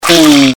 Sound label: evil die; CAC ASSETS